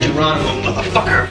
fall; quake2; mcclane

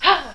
jump; quake2; chastitymarks

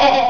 bump; quake2; sheep

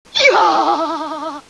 jump; quake2

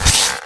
gasp; quake2; minibot

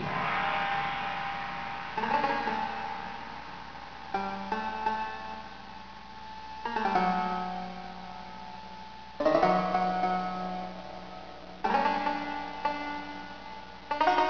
shamisen; quake2; chaos

